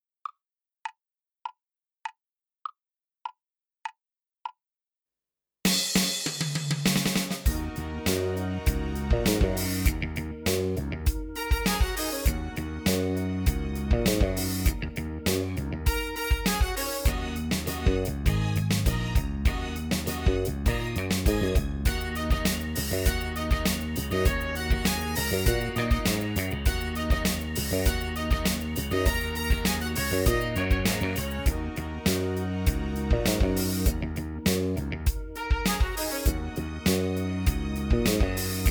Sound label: Funky Chicken with drums; Drum Kit exam